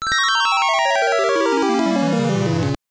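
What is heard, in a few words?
Jingle, Game Boy Color; Chee-Chai Alien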